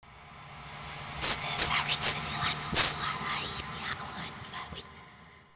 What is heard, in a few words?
reversed; slender